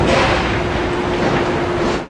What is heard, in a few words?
construction; minetest; digtron